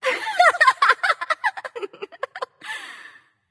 laugh light; smite; voicepack; hel

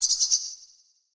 land bird; smite; chaac

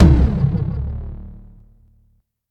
Taiko Mid; smite; raijin

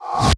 ghost bag; smite; zhongkui

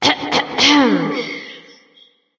clear throat light; smite; voicepack; hel

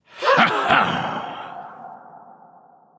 heh heh; cernunnos

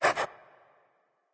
dog pant; smite; scylla

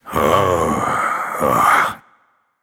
thinking; smite; geb

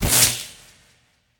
bite; smite; serqet

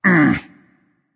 tongue berserk; cuchulainn

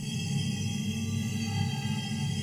debuff; smite; khepri

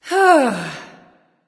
sigh; smite; artemis

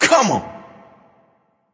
grunt hurt

